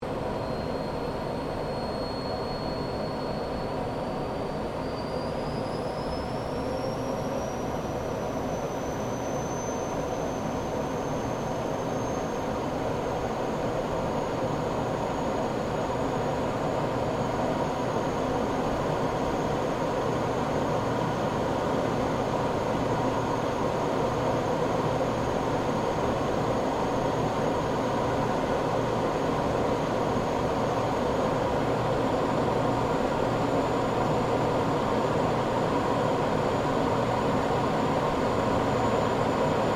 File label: AIRCRAFT GLOSTER METEOR F MK8 FLYING INTERIOR, Gloster Meteor fighter jet; Airplanes